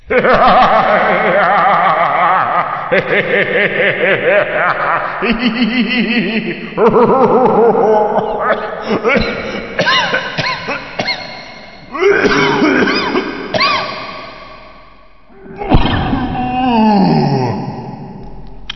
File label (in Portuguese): risada, crazy laugh risada malefica